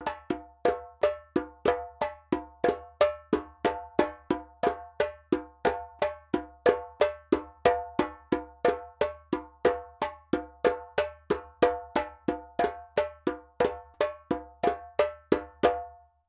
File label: djembe; djembebog oevelse